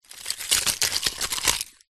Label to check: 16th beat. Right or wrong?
Wrong